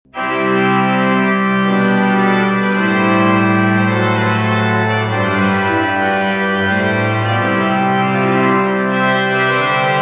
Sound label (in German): Physharmonika